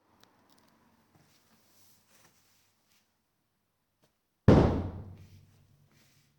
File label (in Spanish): Golpe